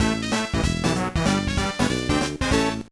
Jingle, gba

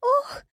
rescue; Hollow Knight; Bretta

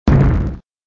splosion; Chibi Knight

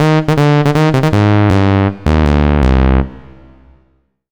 Solo Clean Sawtooth Negative; Kitty Nigiri

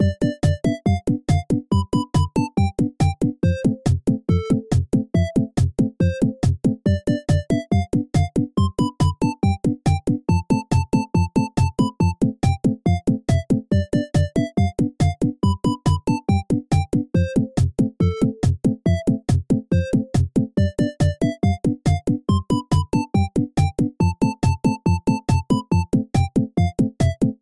A Funny Song; Item Asylum; Roblox iOS mobile PS4 PS5 Xbox One Xbox Series XS gamerip 2020

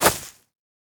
footsteps skid dirt

